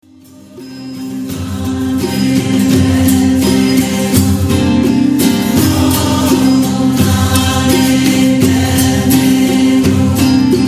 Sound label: Om Mane Padme Hum